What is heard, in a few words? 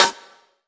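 snare, Classic Hip Hop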